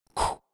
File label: blockstun